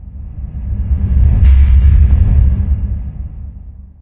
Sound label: citadel cloud hit; ambient; city; source engine; episodic